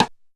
Yamaha RX15 Rimshot

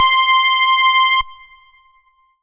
Roland D-20 Bright Power C6

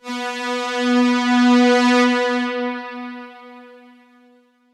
Roland D-550 Strings C4

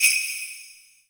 Kawai K11 Sleigh Bells

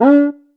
Cuica

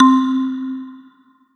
Yamaha V50 Marimba C4

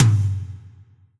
Roland SC-88 Mid Tom

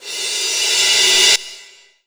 Roland SC 88 Reverse Cymbal

